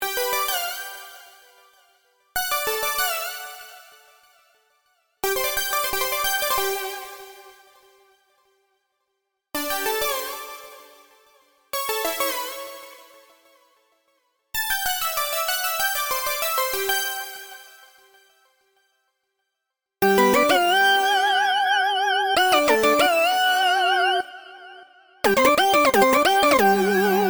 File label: Synth Same Melo; Silex